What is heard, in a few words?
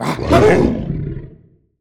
Canine Loud; The Decline Of Warriors; Monster Sounds Volume II